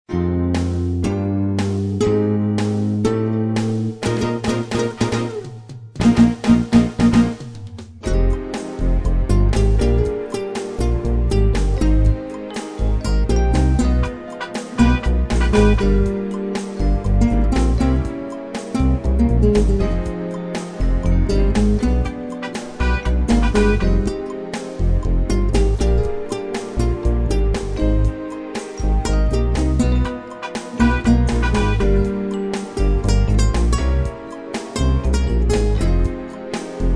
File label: Nylon Strung